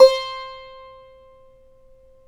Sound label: SAZ; Spectrasonics; Hans Zimmer Guitars VOL-2